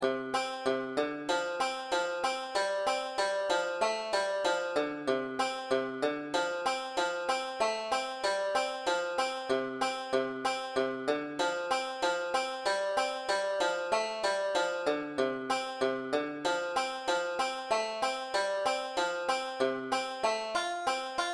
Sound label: brethren we have met tenor banjo f solo; easy tabs